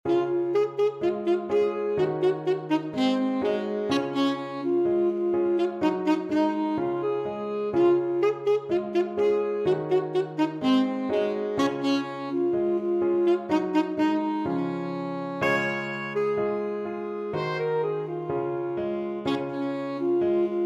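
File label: banana man, alto saxophone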